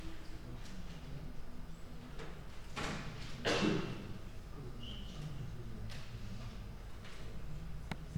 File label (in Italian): Rumore di fondo